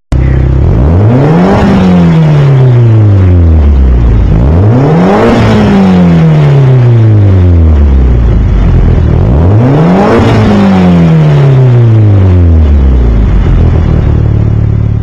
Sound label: Evo Exhaust Sound